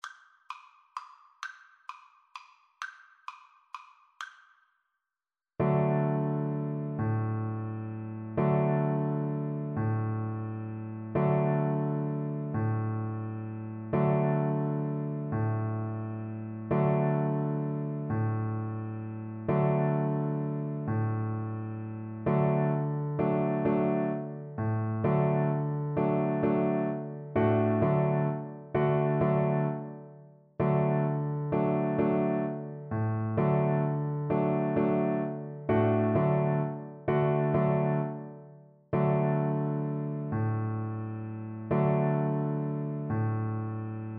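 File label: viola karaoke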